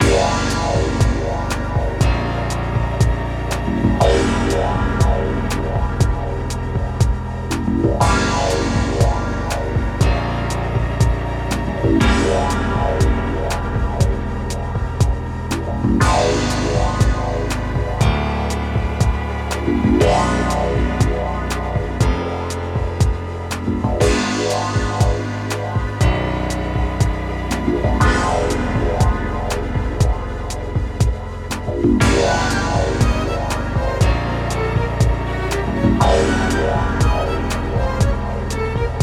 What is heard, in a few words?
Rebellious Atmosphere; princess maker 5; ps2 psp windows gamerip 2007